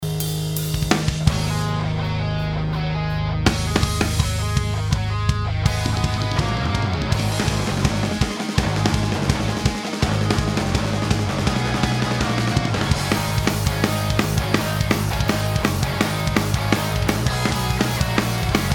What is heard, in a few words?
punkband; reamp